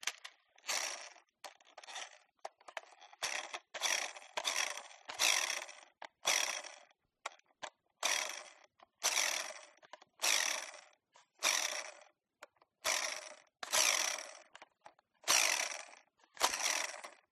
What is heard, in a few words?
toy car rc handling wheel spin manual multiple force varies; skyclad sound